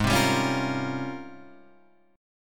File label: G sharp Major 13th, Guitar Half Step Down; chord